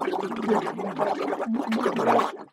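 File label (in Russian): zvuk nasilstvennoy smerti, sound of violent death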